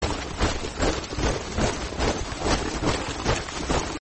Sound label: tink sound; Arc of Templar